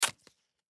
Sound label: Label Printer; Label Printer Tear Paper; Printers; Office; Electronics; Blastwave